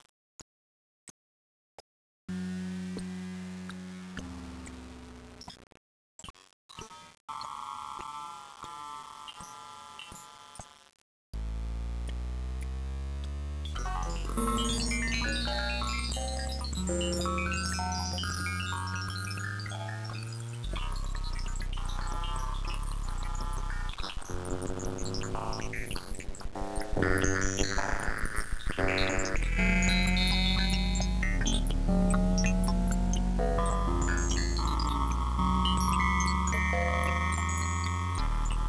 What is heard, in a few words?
hose